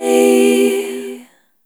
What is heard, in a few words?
Chord E Minor; Sonic Foundry (Sony Creative Software); Pocket Diva World Class Vocal Samples